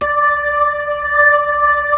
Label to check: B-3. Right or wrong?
Right